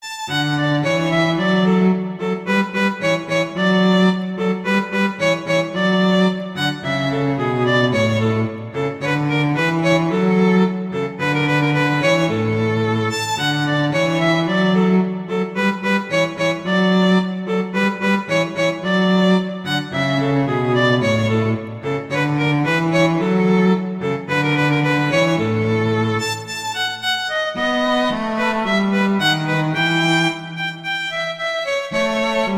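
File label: Duet, violin, viola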